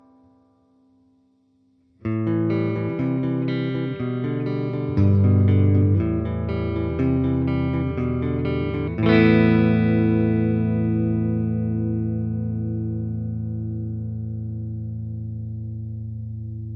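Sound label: cln reverb, clean reverb; crate fw15r testbericht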